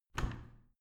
washing machine modern open fast

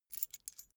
key ring small put down

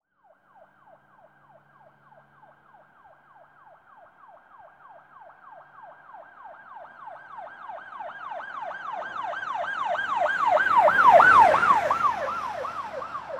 police car with siren

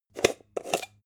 jar plastic midsize open short

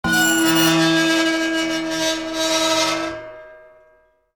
metallic scream

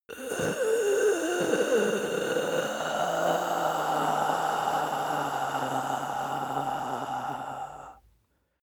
breath male exhale die

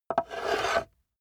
metal scrape on wood

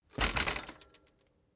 bicycle street bump

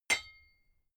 hammer hit ring high

